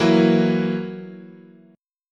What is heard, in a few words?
E Suspended 2nd Flat 5th, guitar chord